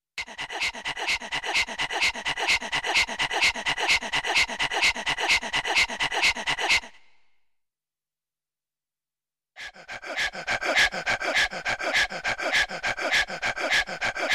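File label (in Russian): смешное ускоренное дыхание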